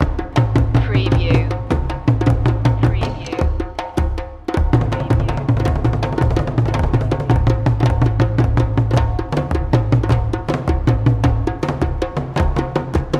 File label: african drums bugobogobo fusion loop